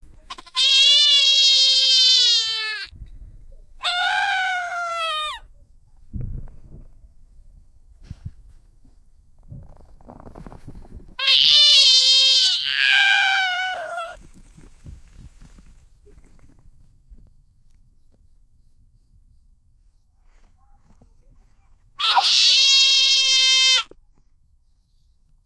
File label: monkey jumping screaming; animal vocalizations